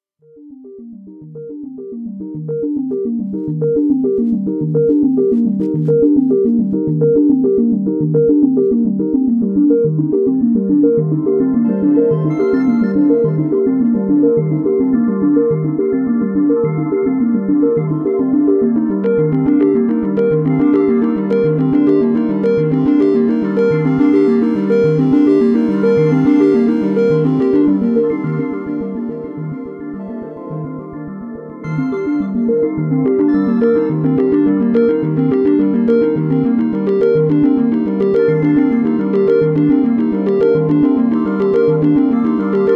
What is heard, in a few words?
Stacked Polys